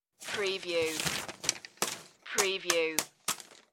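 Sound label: Handling Paper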